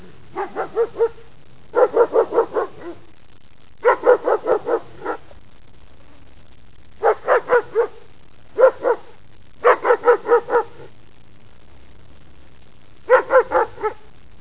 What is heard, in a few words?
barking